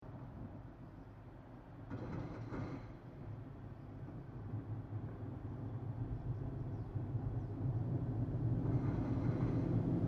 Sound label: Industrial Quarry Large Articulated Dump Truck Volvo A40 Passby Construction; Construction Vehicle; Tom Hutchings